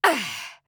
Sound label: Grunt Slow; Realm Royale; Hunter